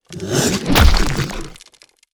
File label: lancer sefx; monster